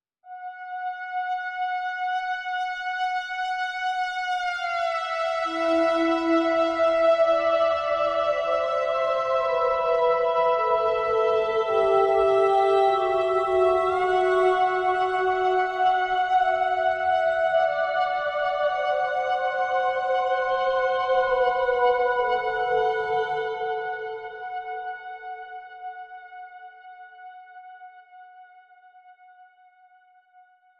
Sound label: Synth and Soprano Only